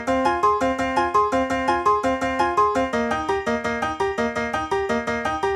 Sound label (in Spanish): PIANO; multitracks